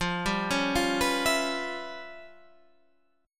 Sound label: C sharp 7th Sharp 9th Flat 5th, chord